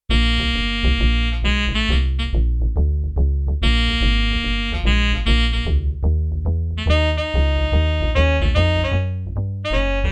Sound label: ALTO SAX BASS; Yamaha TX16W; Jazz Performance